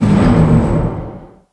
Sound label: Elevator Loading